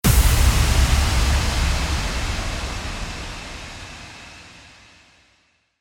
IMPACT

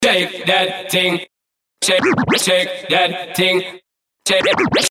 SCRATCH 99BPM

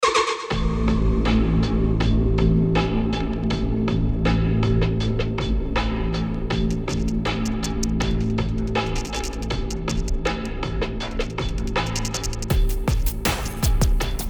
DRONE Amin 80BPM

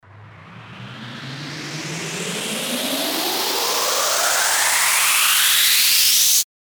RISER

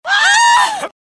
SCREAM

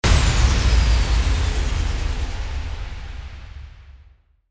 IMPACT

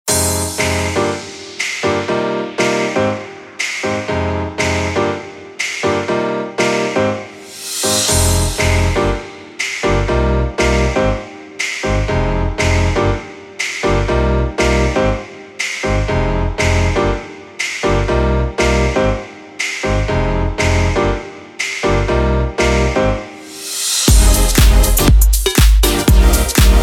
INST 120BPM Fm

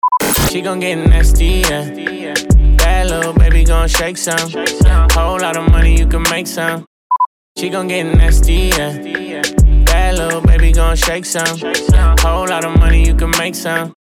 104BPM D

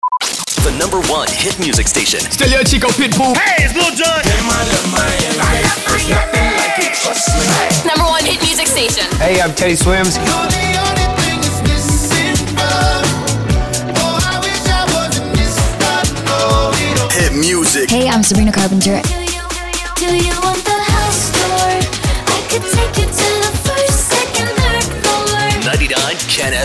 sweeper beatmix

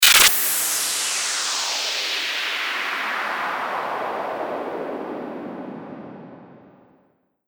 STARTER WHOOSH

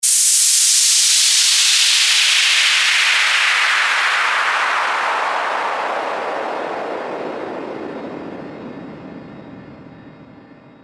WHOOSH

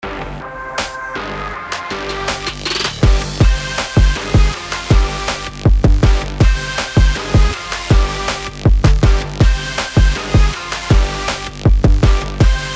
TRAP GMIN 160BPM; BED

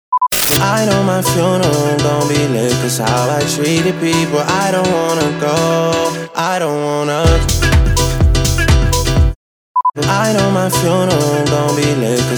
MASSIVE 125BPM Em

